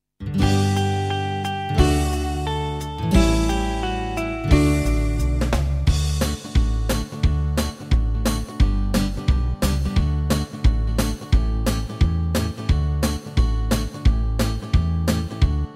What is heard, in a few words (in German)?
Volkslied Mit Variation, gitaar